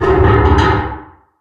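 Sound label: metal frame groan heavy; Source Engine; Portal 2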